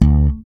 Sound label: slap bass